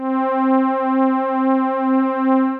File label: LIVING STRGS, poly 800; TECHNO-BWARE